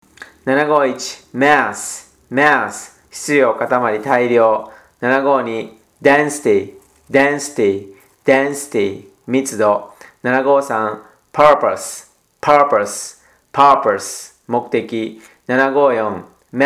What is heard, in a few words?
tango